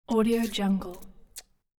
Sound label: toothpick sucking with lip smack; hollywoodedge